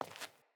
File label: Sandals Walk Default, footsteps; Player